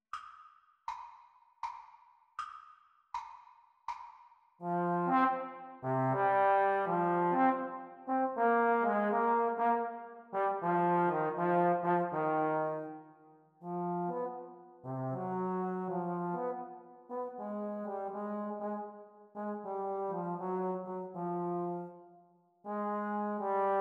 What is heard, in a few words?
Playalong for Eb Horn Practice Speed